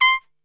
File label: C 4 X PIC SHT, Brass; Best Service; Brass Super Section